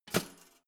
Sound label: toaster pull down hard